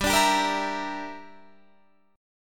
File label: G Minor 6th, Guitar Standard